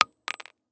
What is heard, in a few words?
shell 39mm carpet; STALKER; IWP